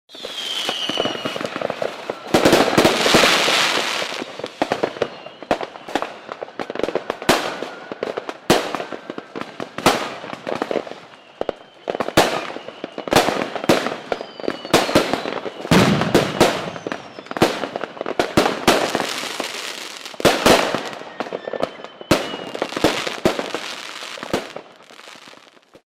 vzlety vzryvy rossyp ognej; звук салюта или звук фейерверка

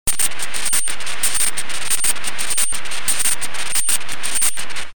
eshhe sensornyj komp

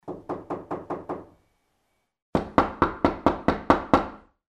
ostorozhno potom trebovatelno; Звук стука в дверь для сказки; Для детей, для детских постановок

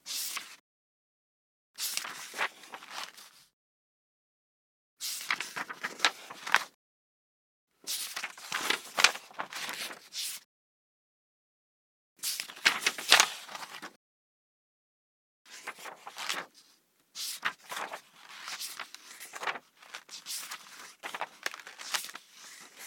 bystro prosmatrivajut v knige

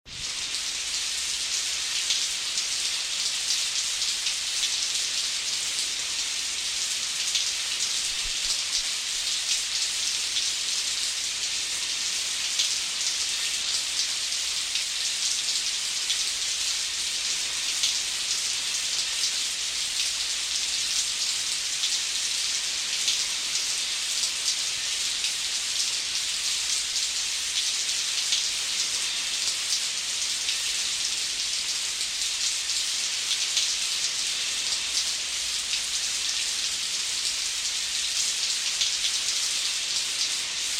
po tverdoj poverhnosti; Звук дождя; Звуки дождя сильного, моросящего

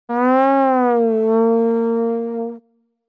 neudavshijsja jeffekt truba

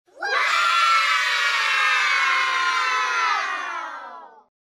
tolpa detej; Вау звук

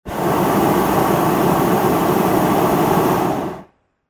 snizhennyj uroven gorjachego vozduha dlja snizhenija

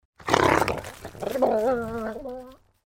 ogryzaetsa i ubegaet

